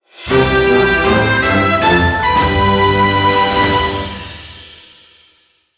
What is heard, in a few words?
class change